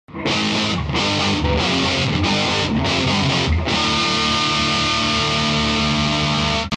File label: badcat double gain